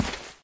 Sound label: grass step; Golden Crayon Sounds